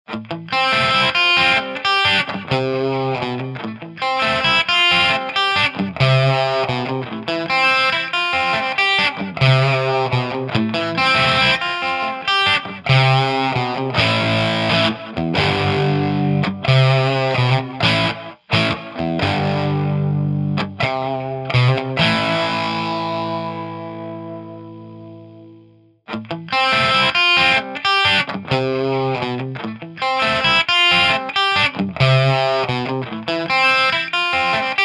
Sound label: gain050 single coil; olcircuits; thunderchief